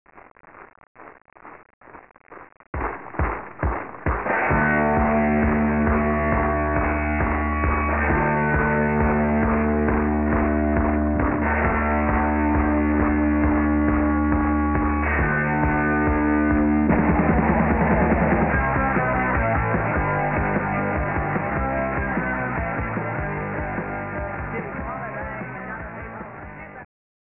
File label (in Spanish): TAMBOR